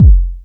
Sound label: kick; USB Soundscan vol.20; Fresh Disco House I; AKAI; KICKS